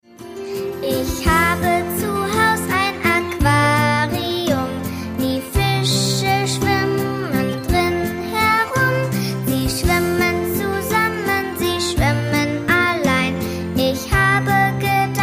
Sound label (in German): fischetanz